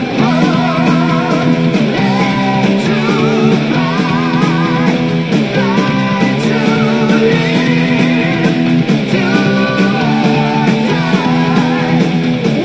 music

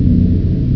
pipe

